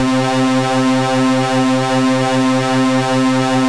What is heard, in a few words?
juno big lead; synplant